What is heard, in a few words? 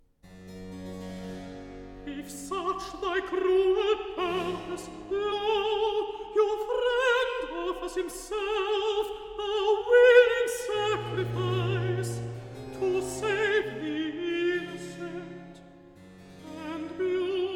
Recitative; (Hamor) If such thy cruel purpose; Handel Masterworks; Klassika; Act 2